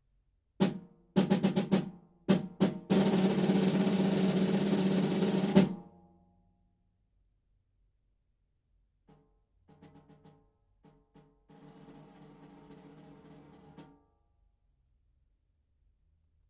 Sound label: goat white